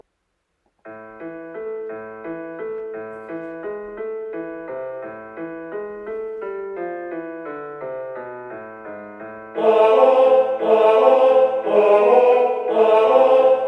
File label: tenor; marmotte